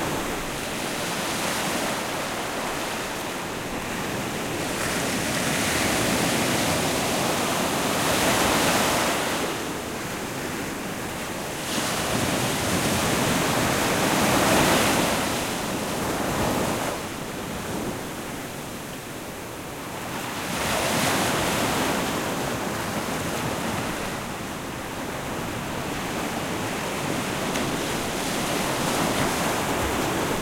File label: Ocean Surf; bejeweled 3